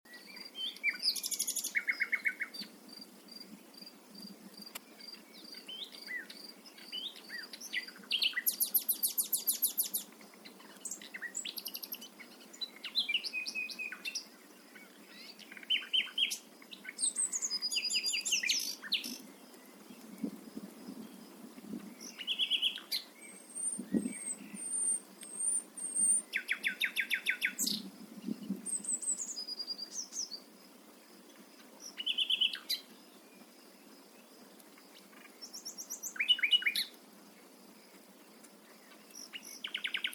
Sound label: Luscinia megarhynchos; SETE